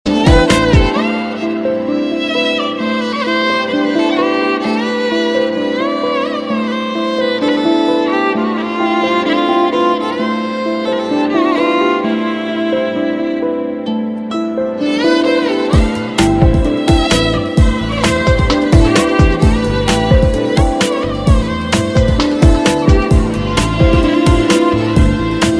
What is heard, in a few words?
tear drops on violin